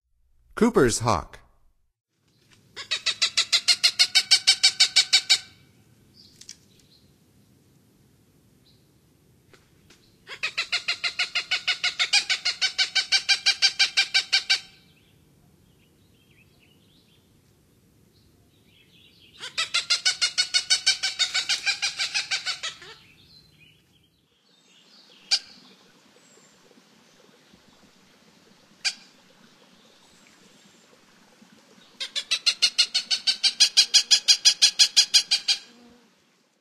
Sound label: Coopers Hawk; North America; Stokes